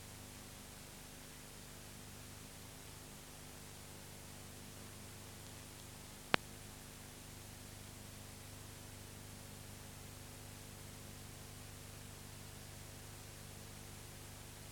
Betamax blank tape hiss

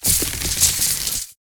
chain lightning secondary strike; psfx; 3rd level spells; call lightning

